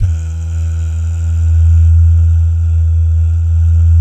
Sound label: DAH, Vocal Planet, Jazz & FX, DAHS GUYS; ILIO